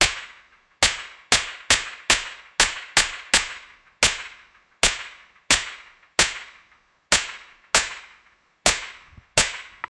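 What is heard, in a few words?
pneumatic air gun shooting; weapon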